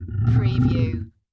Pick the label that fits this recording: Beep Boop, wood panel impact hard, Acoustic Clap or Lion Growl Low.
Lion Growl Low